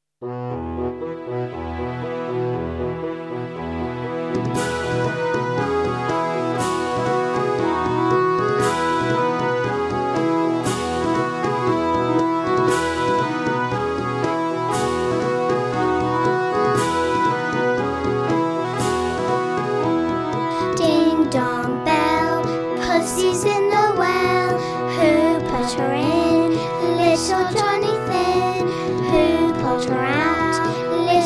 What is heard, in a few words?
ding dong bell